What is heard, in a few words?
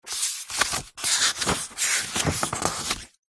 household book diary page turn